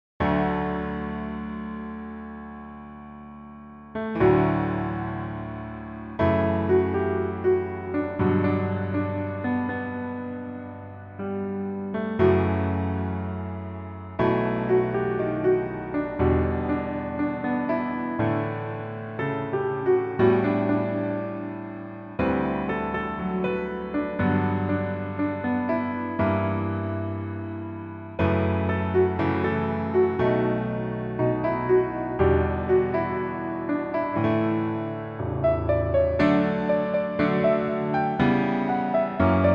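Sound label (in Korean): Piano version 멜로디MR -1키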